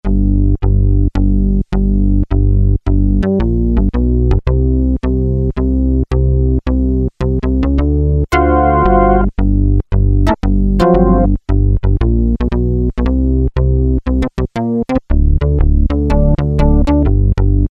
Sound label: Chorus Funk, Roland Juno-106; Factory Preset